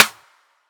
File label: snare; 7rystan's Snare Pack 2018